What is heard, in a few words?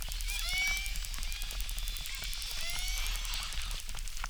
Chirp zap, acquarium